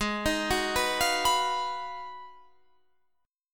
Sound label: Ab Minor 13th, Ab, Guitar, Standard; Abm13 arpeggiated; JGuitar